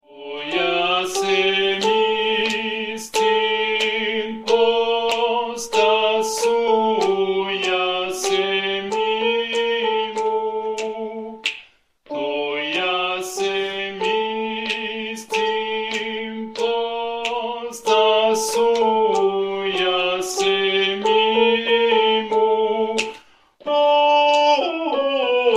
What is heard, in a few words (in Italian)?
Soprani